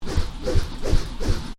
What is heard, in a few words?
Swish twirl, Swish: Twirl Loop; Whoosh Sound Effects; Whooshes (Sound Effects:Sound Design)